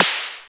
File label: Snaredrum, Roland System-100; Drum Samples